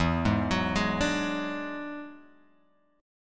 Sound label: E 9th E down Guitar Open G